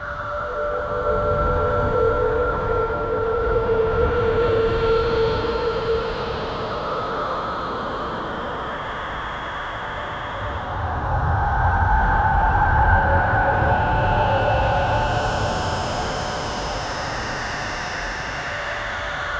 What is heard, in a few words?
dark abandoned asylum amb